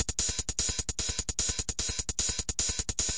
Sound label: 150 stereo tekno hats, drum loops and breaks; 141 to 160 bpm